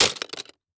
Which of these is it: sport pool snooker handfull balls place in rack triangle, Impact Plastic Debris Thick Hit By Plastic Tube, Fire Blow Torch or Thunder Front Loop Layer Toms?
Impact Plastic Debris Thick Hit By Plastic Tube